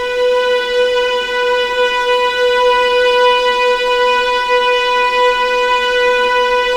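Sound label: B2 legato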